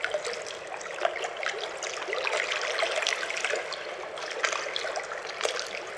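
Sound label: ambient light waterlap loop; atmosphere; Counter Strike Model Pack; CS:GO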